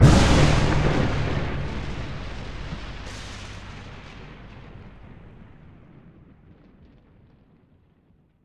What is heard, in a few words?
THUNDERSTRIKE; AVIE